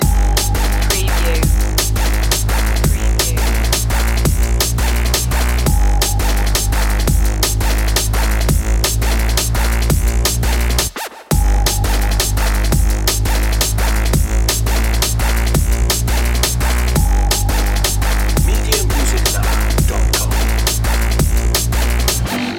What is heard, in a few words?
subharmonic panic loop